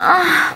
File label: orgasm; Voice